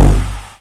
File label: pulse jet off, engines; acf engines